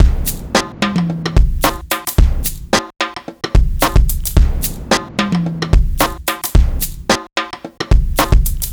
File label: 110 Pop Drums B, Fast Tempo; Pop Rock; Digidesign; Pro Tools; Pro Tools Essential Loops